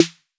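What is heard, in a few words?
808 snare drum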